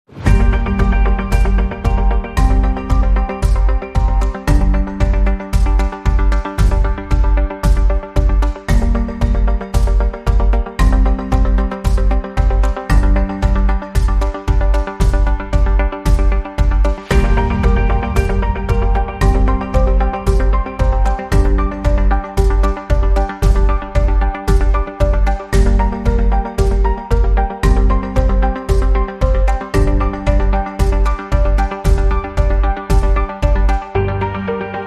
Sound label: Public Opinion, No Strings